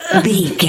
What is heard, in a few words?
voice female effort push fast